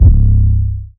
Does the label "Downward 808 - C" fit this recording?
Yes